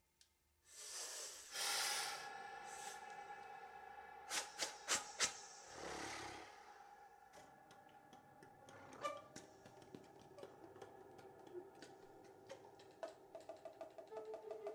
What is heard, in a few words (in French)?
cantus firmus, flute a bec